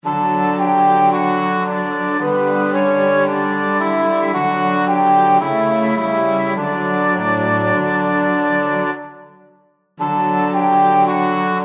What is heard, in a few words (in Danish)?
Det er aften. Instrumenter. D dur. 3 stemmer. 3 vers